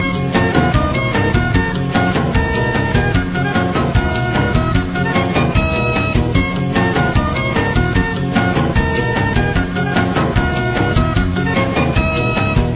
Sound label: random loop